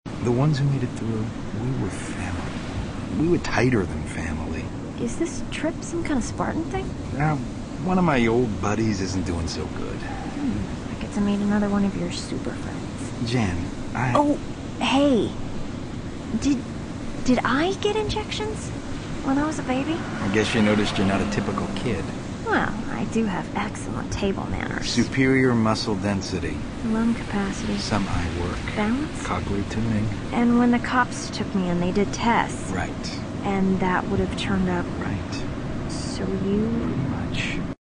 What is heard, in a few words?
Cochlear tuning